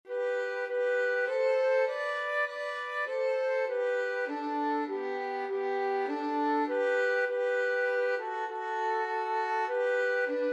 violin flute duet; joyful joyful

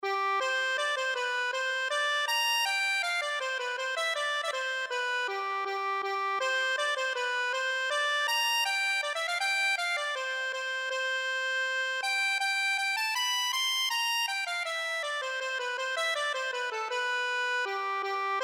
accordion

